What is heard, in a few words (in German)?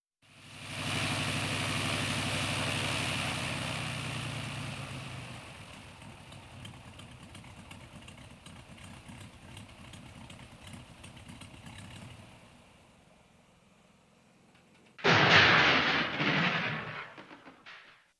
einmotoriges flugzeug stürzt ab; Geraeusche; Katastrophen